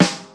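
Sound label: snare ring, Yamaha Motif ES drum machine; 300 Drum Machines; Yamaha Motif ES-Series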